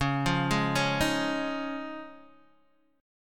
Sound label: Db Major Add 9th Db Guitar DADGAD; arpeggiated; x,4,3,1,4,1